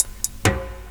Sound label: DRUMLOOP, Ragga & Dub Reggae